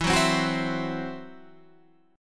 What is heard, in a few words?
E Suspended 2nd Sharp 5th